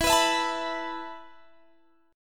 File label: B 7th Suspended 4th E